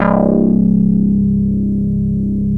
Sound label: ODY, ARP Odyssey